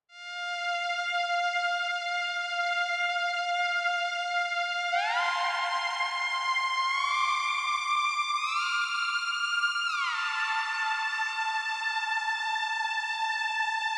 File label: Lead Synth; Martian Chess Audio